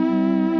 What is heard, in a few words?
nice chord, Synth